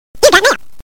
mail

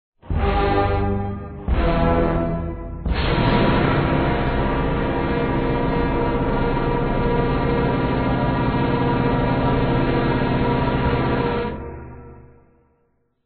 shock; vapesquared

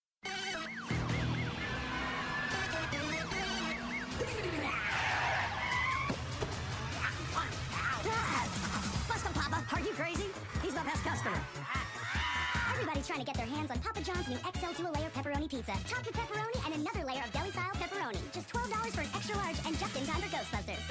scream

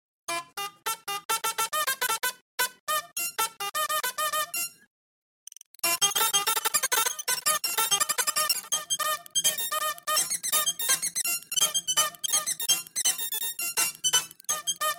cruel honk

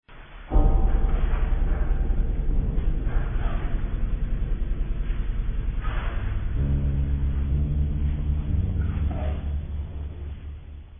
dramatic; vapesquared

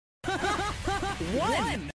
haha one; cave

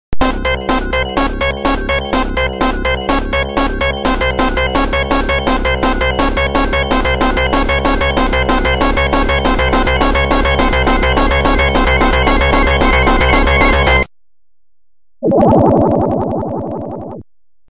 drowning

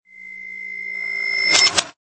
cash; reverse

